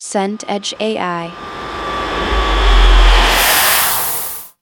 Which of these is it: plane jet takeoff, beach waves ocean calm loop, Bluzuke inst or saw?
plane jet takeoff